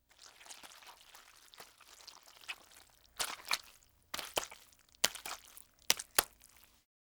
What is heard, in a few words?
Walking in Mud, Slow Squishes